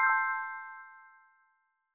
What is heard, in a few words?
confirm, tones; inteface sfx pack 1